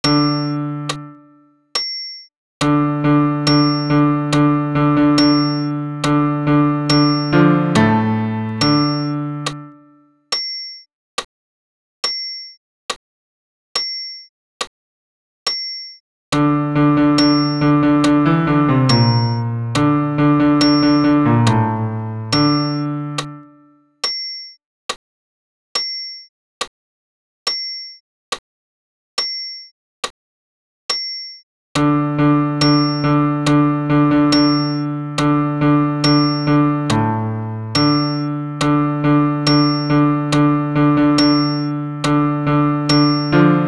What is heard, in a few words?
Gloria Amen bas pian seul